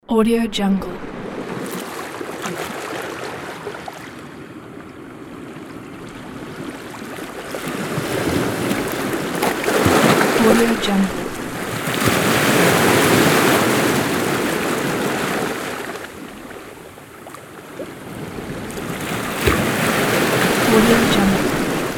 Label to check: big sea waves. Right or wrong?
Right